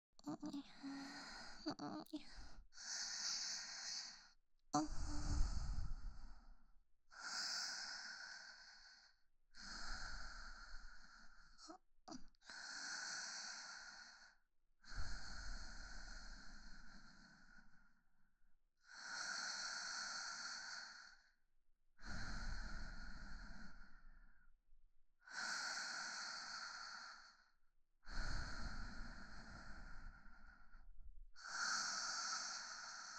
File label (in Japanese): 寝息・彼氏と一緒に穏やかに眠っている彼女; 明るい少女系カノジョ